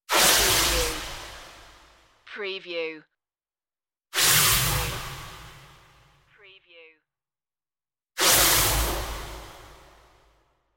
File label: space wind bursts